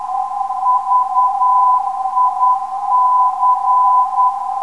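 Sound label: VIC 415kHz, non-directional beacon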